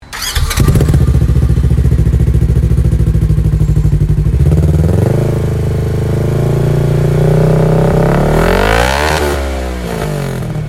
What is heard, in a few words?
Turbo General Sound